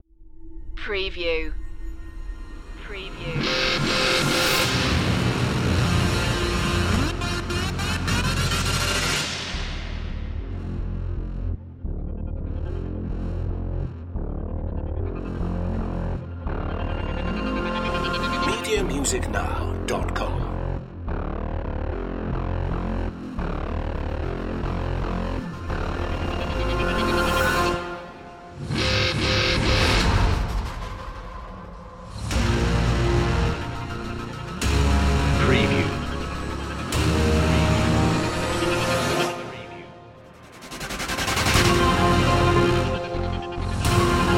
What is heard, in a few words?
energy no perc